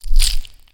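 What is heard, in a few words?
Flash Collision heavy; Puddle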